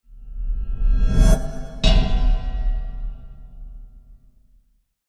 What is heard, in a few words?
Metal Transition Ends With A Hit; Scary Soundscape; Horror; Crafted Signals